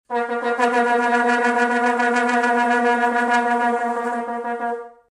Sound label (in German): Vuvuzela impuls